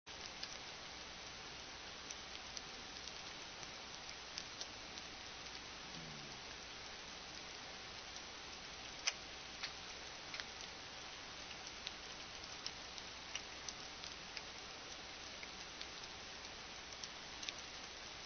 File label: mystery fish groan; biophony; fish longer recordings